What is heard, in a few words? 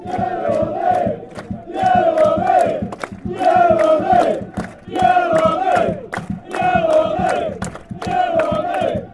yellow army stevenage, fanchants